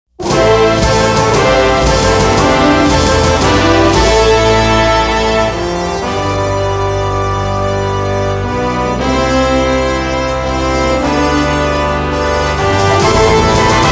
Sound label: Brass Fanfare; Dragon Ball Z Best of - Volume 5